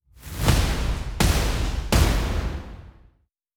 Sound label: Music Level End Result Intro